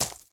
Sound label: bolt gravel, material; STALKER; IWP